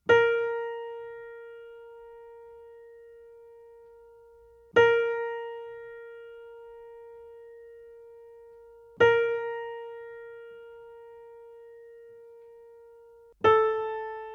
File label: Tuning Note B flat A